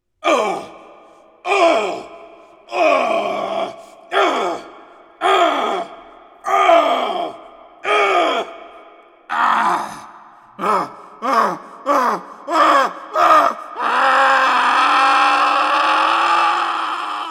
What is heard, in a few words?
Screams; a dump in the dark